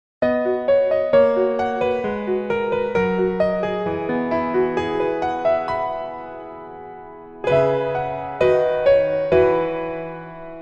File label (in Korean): Piano ver, 멜로디MR 여자키